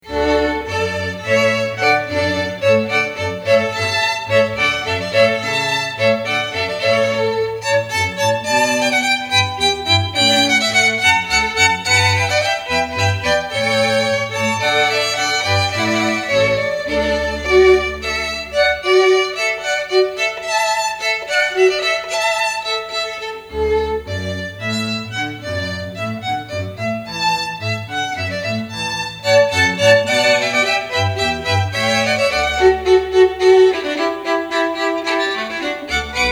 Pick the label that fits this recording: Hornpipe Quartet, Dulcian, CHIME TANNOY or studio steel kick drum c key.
Hornpipe Quartet